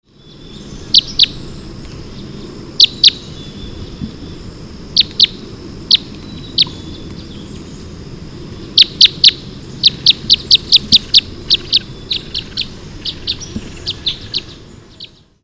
Red Crossbill Type 3, birds, se mich

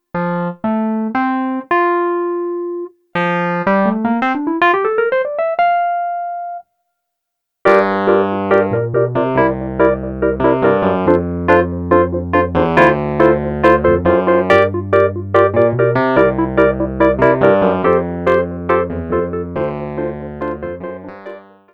wurly

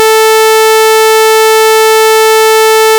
sawtooth

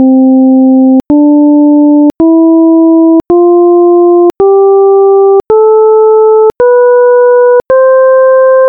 just scale

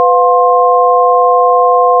inharmonic

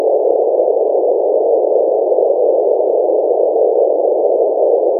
narrowband

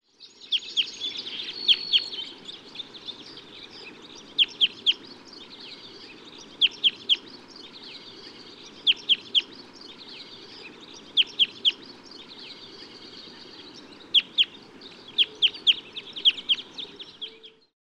Red Crossbill Type 3, birds, se mich

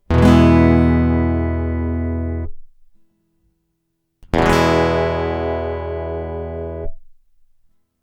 guitar strum

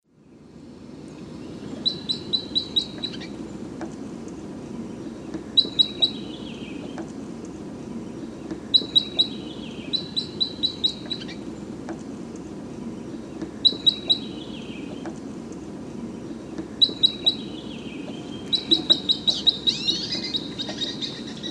Red Crossbill Type 3, birds, se mich

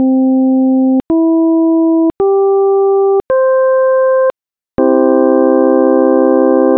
major chord

